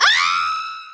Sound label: Scream Vox